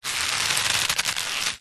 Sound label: rope thick old tension movement creak stress; foley